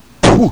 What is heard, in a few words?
hit; svencoop; gruntscourse